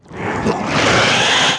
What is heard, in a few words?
Rhinoce die